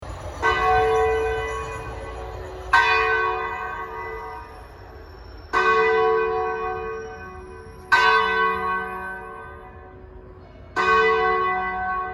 kolokol 12 chasov

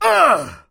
zvuk boli

